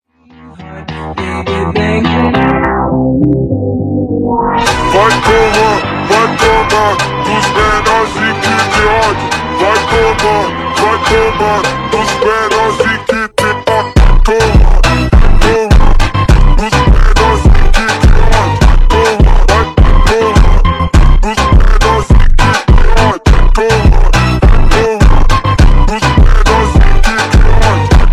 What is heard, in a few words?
Funk Super Slowed